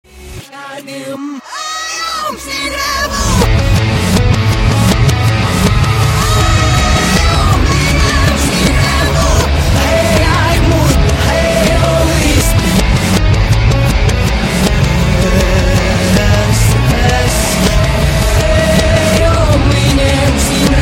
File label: Reverse